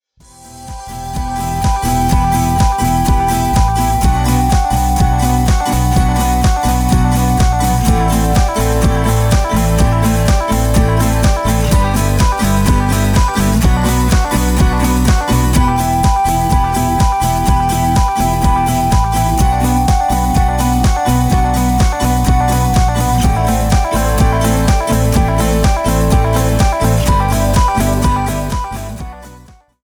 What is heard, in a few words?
Summersphare 125bpm remix